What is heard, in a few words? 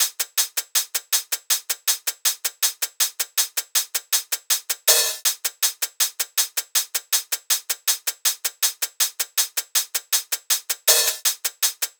hat loop 80, Drums; Pop; Hat Loops; Slate; SD PASADENA Pop Sample Pack